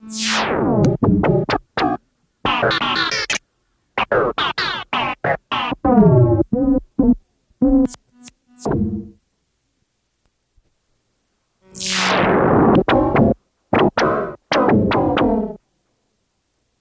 Starship Pops Tones

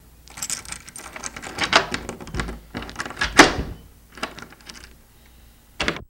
Keys Into Door Lock

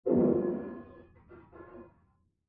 Crash Metal Impact, windows & mirrors

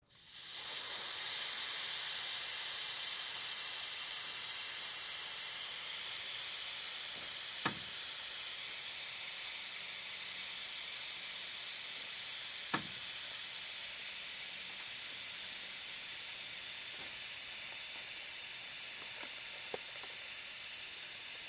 Tire Deflating Close

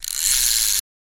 Fishing Line Reeling, fishing pole; Fishing Line Reeling Out Loop

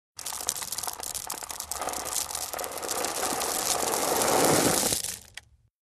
Sand Rock Gravel Pour, rock exterior

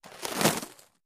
Arrow Bys Fire Sizzle; bow & arrow bys

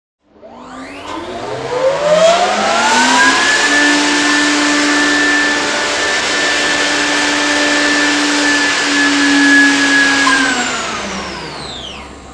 Factory Atmos Close

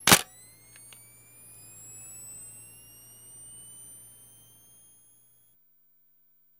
35mm Camera Clicks; shutters & movement

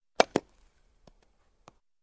Baseball Bat Alumin; baseball fx & games

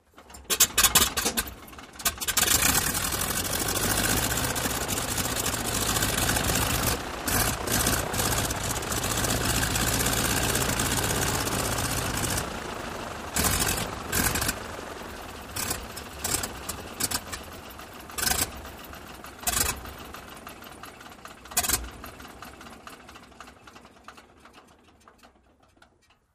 Prop Plane Start Idle, int

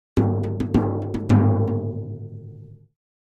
Drums Toms B Herald, drums tom

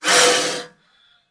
Cobra Indian Hiss Clo, snakes

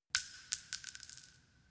Shell Case Sngle Tube, shell casing movement

